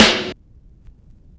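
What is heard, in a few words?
Vinyl Snare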